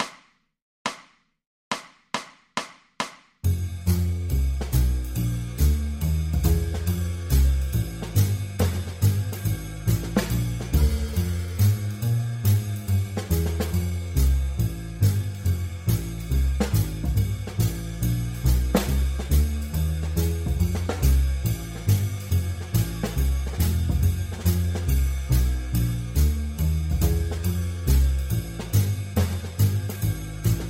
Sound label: Blues Backing Track; Beginner